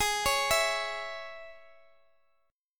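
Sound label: Ab Suspended 4th Sharp 5th chord, Guitar, Standard tuning; arpeggiated; JGuitar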